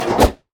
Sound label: red mech melee basic attack; LoL